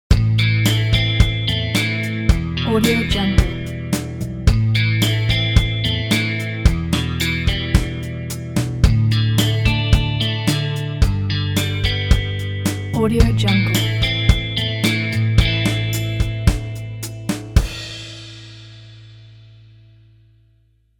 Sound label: electric guitar ident